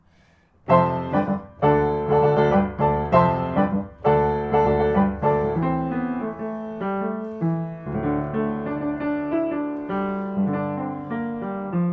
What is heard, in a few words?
melody